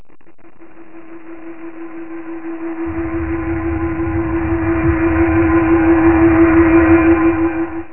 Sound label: heal king; landslor